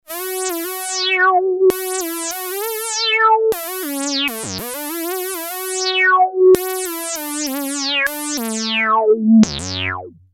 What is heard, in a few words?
lead zanzara